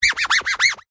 poster holographic scratch grooves with fingers whizz sound fast several; foley; zapsplat